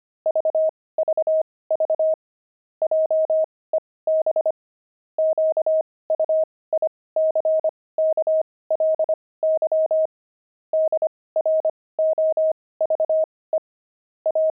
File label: pangram, intermediate pangrams files